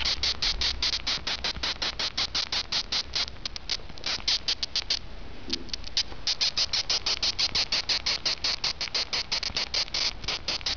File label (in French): cri, atropos